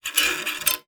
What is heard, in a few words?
Classical Guitar Extended Technique